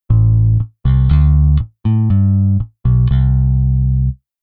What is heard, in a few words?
Bass